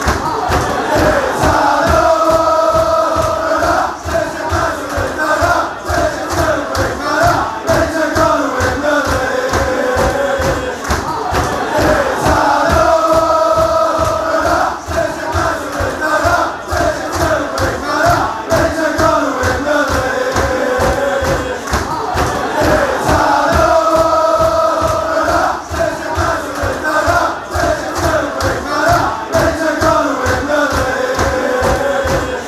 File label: fanchants